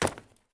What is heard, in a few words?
case drop; Escape from Tarkov